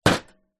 household solid small item set down on metal table